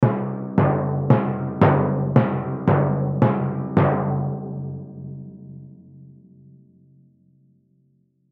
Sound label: Timpani Hit, Timpani Hit Multiple Walk; Cartoon; Sequence Dread Doom Musical; Blastwave